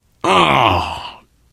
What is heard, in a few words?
reaction disgusted, biker, voice